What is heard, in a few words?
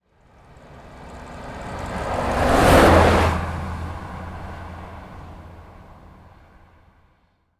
truck pass by